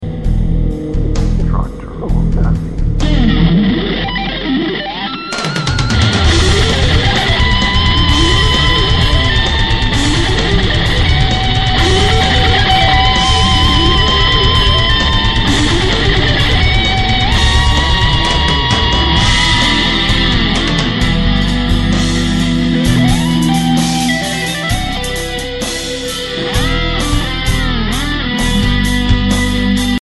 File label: heavy guitars